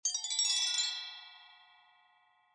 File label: tone